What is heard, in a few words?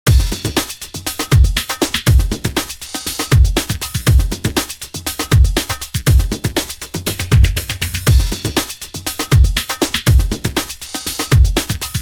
drumloop no filter